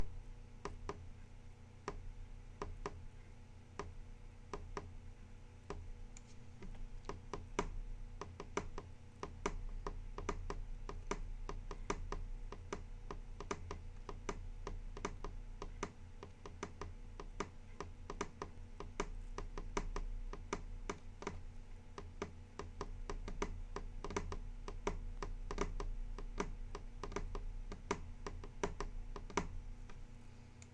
finger drumming

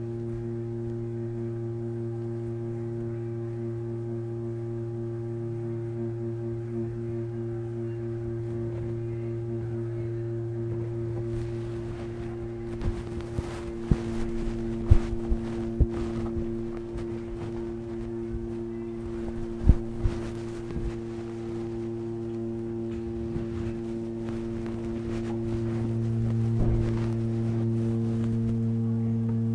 toilet hum

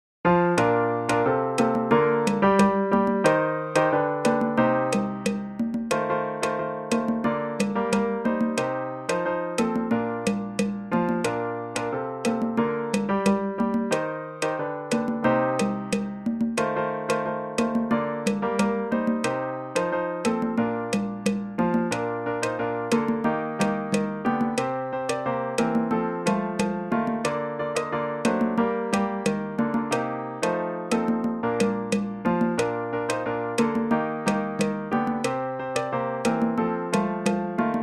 midi

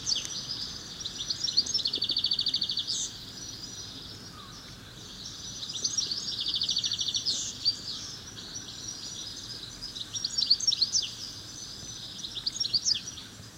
dawn chorus

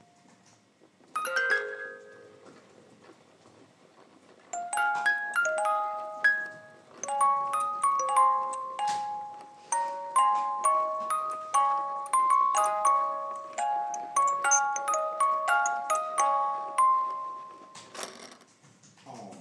musicbox

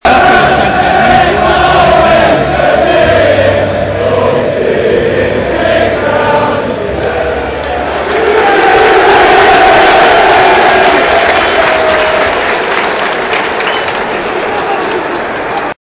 forest chant and kick off cheer

